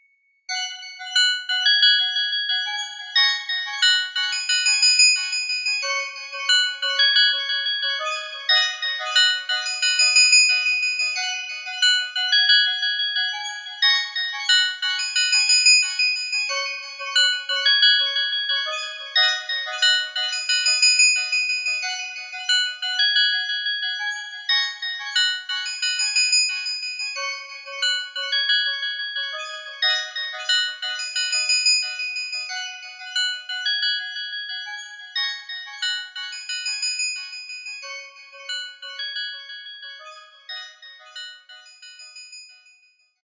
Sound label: wake up tones